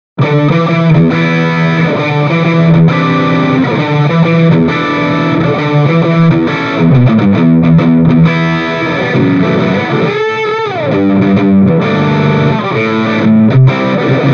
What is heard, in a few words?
Distortion, Stratocaster; Mini Rox Mic Inside; Seventy 80